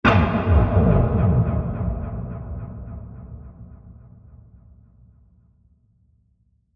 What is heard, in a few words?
Digital Sci Fi Impact Blast; Impacts; Crafted Signals